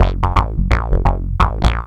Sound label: Bass Loop